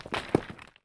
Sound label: dirt